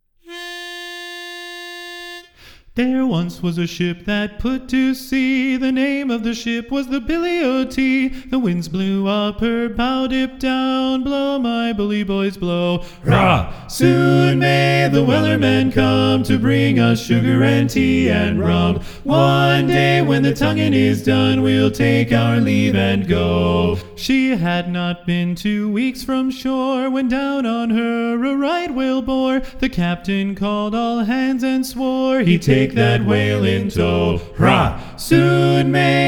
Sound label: Sea Shanty Medley TTBB; abridged; Tenor Mute; west region spring sing